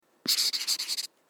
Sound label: write; The Witcher Hunt